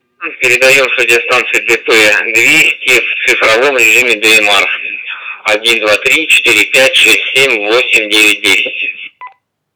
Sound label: lira dp200 tx dmr